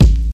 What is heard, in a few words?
mid heavy steel kick drum sample A key